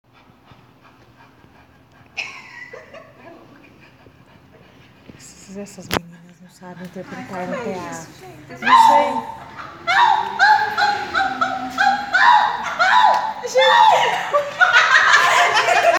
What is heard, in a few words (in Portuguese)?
cachorrinho, imitando uma cachorrinha